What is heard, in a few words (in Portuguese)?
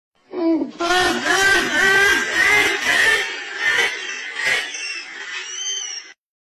caindo para tras